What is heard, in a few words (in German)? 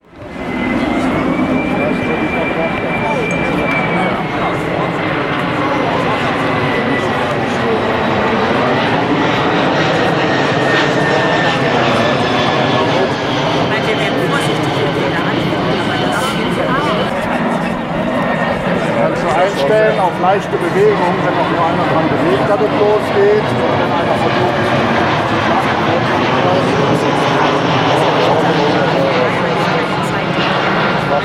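radnacht fluglärm; 4 meerbuscher radnacht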